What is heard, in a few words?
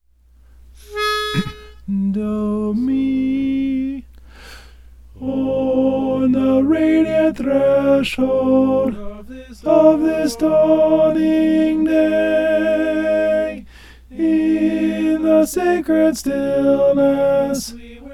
alto